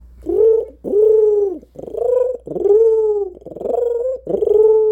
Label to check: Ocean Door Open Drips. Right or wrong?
Wrong